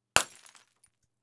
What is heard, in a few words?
Broken Bulb